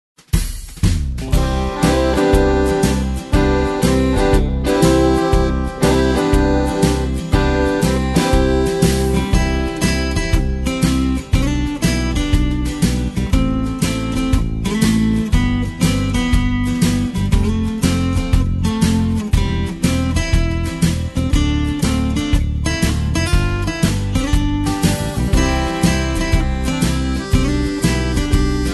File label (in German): Liebe Instrumental